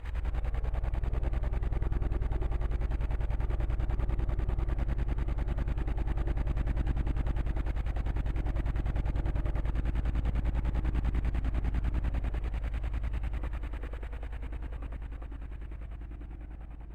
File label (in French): saxo PVC